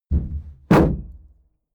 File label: shield drop wobble